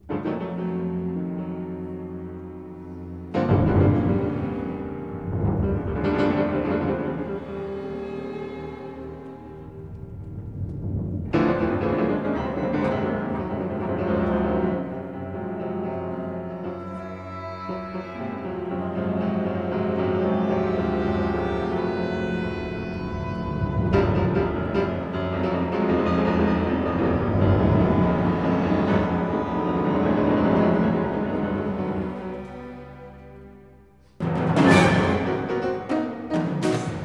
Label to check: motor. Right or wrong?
Wrong